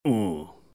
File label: beef grunt